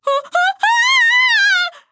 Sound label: fear; Skye; Paladins